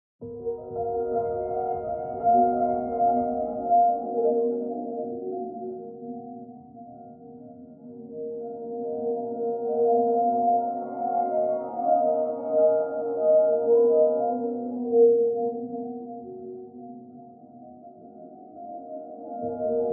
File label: piano Convolve